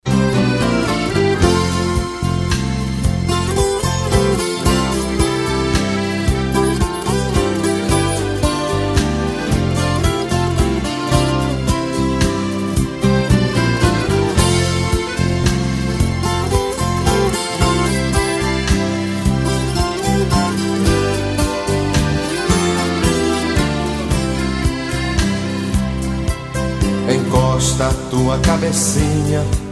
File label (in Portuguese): sertanejo classico